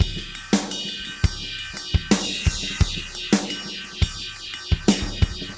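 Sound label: 86 bpm, beat loops; Zero-G; Monster Beats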